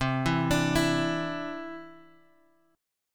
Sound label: C Major Add 9th, Guitar Open F; Cadd9 arpeggiated